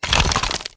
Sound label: skeleton hit; trespasser v2